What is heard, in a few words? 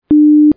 Spinal Beep